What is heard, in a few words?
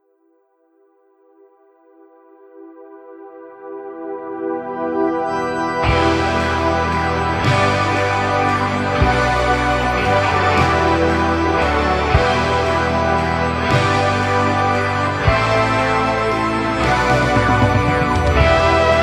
lullaby